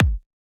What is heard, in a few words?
dance kick edm; osu